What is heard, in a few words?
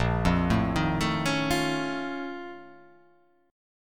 A sharp Minor Major 11th